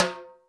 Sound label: Timbale Mid, Yamaha PTX8